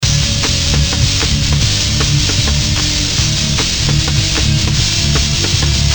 ambience

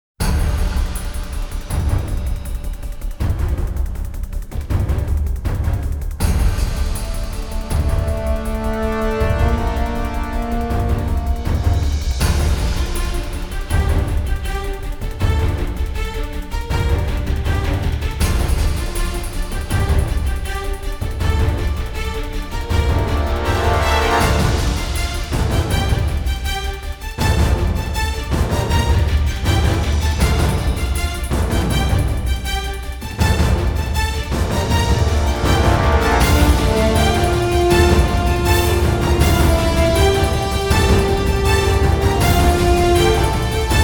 of catastrophic proportions instrumental

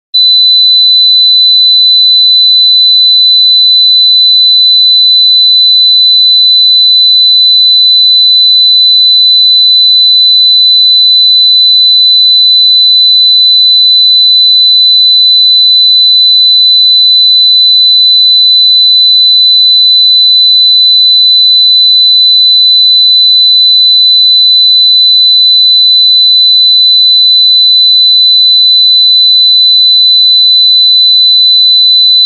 4 kHz

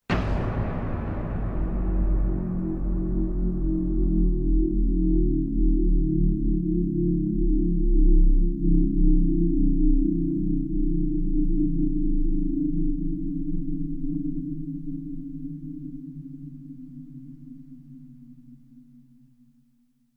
zero gravity gunshot

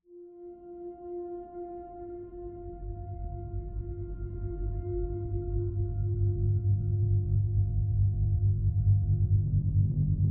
ambient

